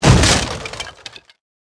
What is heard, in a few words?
wood box mid; World of Tanks Blitz